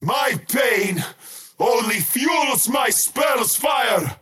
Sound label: skywrath crown cast pain; skywrath mage